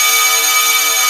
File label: A#4 METAC; Northstar; The Wizard VOL-1; SYNCLATRON